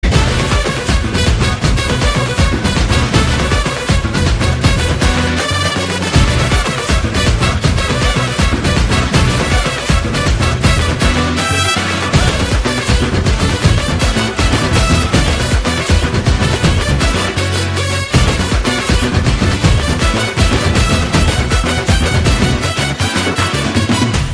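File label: background music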